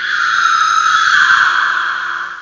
SCREAM; Amiga; odyssey

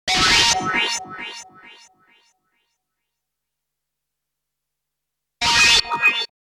synth; widdagroovebox

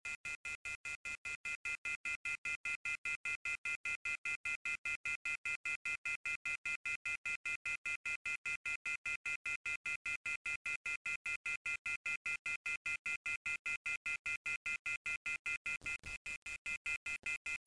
hang up

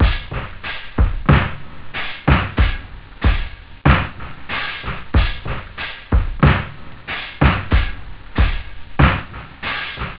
skull, house; OctaMED SoundStudio V1

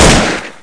ak74 fire; Soldat

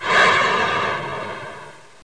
MISSLE

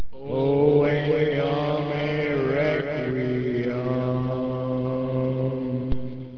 chant

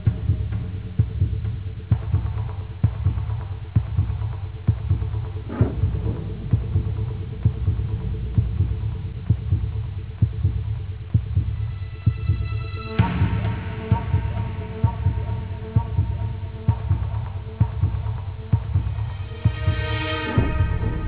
music; hunter

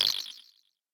ricoshet

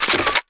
part detach

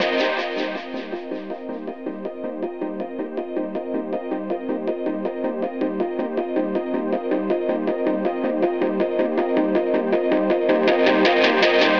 AMBNT 160; eJay; Ambient

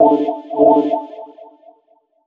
D+B 160; eJay

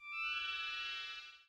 Sparkle; Thinkin' Science; EdMark

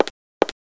feet; Sexy Games 3

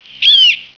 Grizzly; Grizzly asterisk

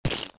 IceBall Splashed; bob espo

